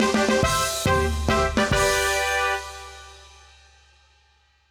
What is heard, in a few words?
quest clear; Happy Birthdays